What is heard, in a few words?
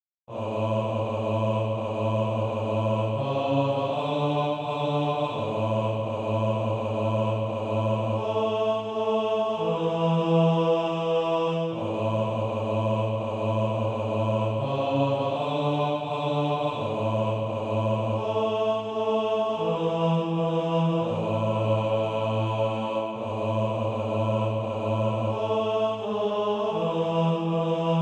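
bass, Practise Music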